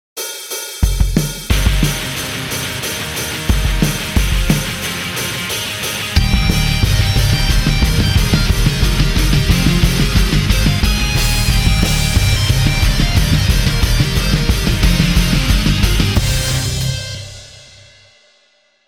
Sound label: Heavy Metal Truck Ad